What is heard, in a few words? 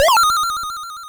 coin; singe; Super Don Quix-ote.daphne